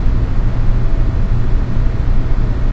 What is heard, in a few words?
tone